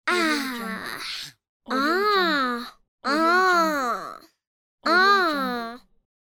little girl amazed

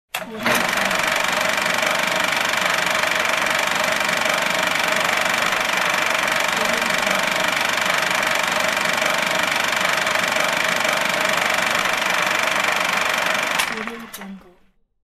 film projector

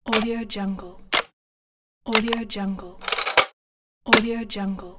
click

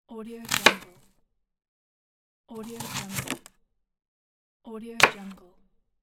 chopping vegetables

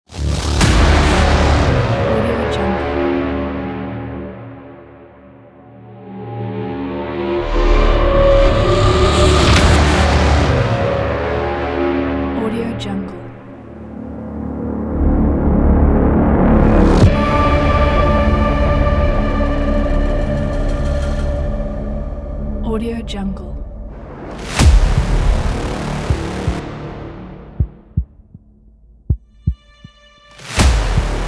horror trailer

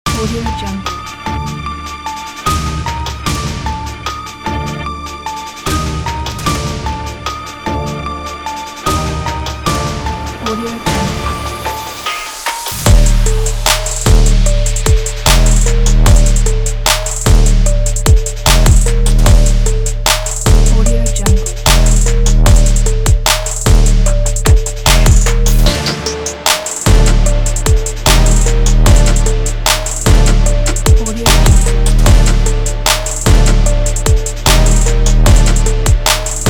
modern epic rap hiphop

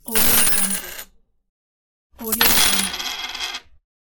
coins are ringing

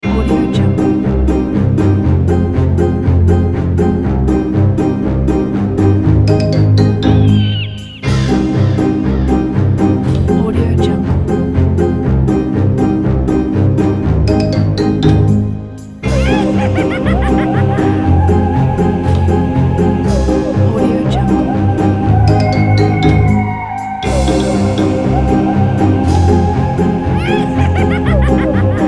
funny halloween

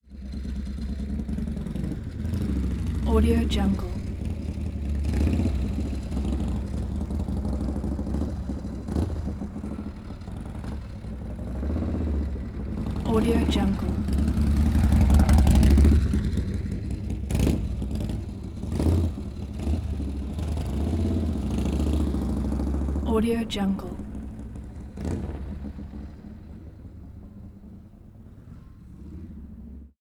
harley davidson motorcycle maneuvering

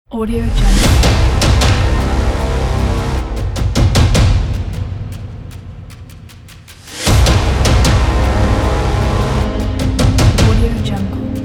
logo ident intro trailer

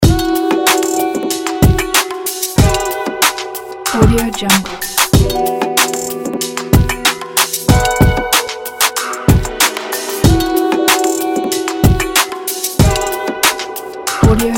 smooth sentimental trap

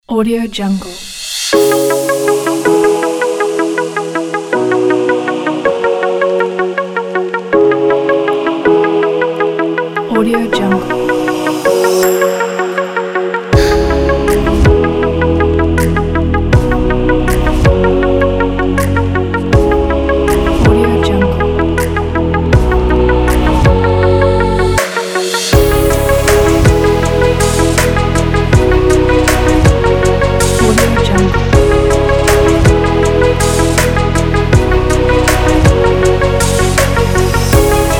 corporate technology

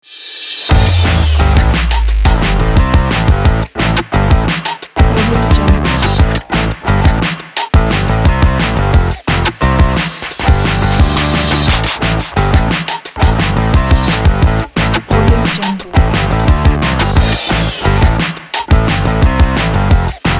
racing maniac

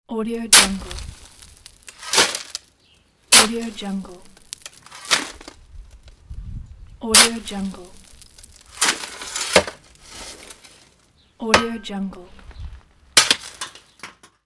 digging and shoveling

